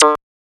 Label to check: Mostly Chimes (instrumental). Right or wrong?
Wrong